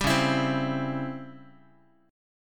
C sharp Minor Major 7th Flat 5th Guitar; C#mM7b5 strummed; 10,9,7,10,x,x; JGuitar

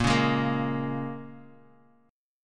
A sharp Minor 7th, A sharp, down; A#m7; strummed

